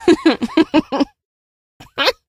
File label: laugh; episodic; episode 1; Source engine